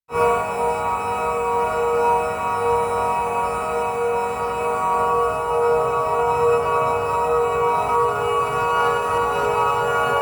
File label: Piano Rain; TastyChips GR-1